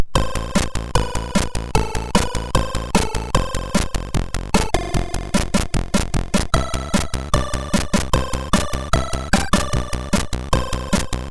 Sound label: sid, MOS6581R2